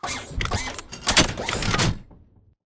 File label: bt combat walk to idle; Titanfall 2